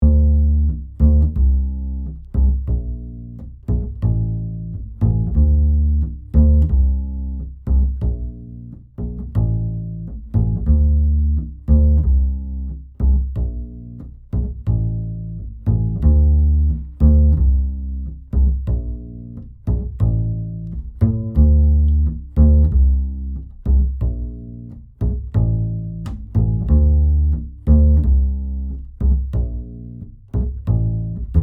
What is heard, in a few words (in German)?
nur Basslinie; Toelpel-Treff; improkalender